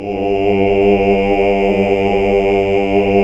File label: Basses